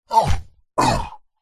horror monster short breathy sneeze or quick exhale